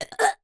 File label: human burps two children 5 and 7 forcing burps